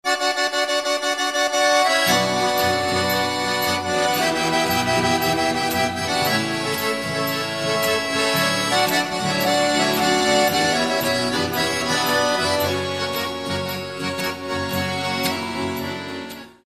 instrumental

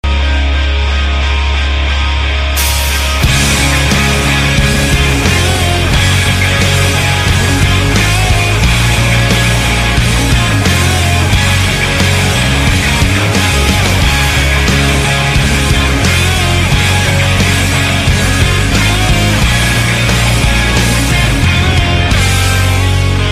instrumental

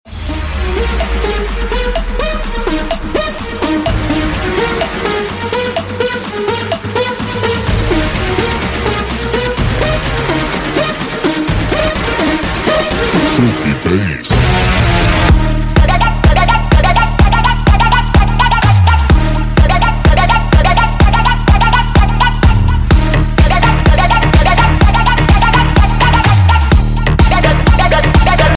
filthy bass

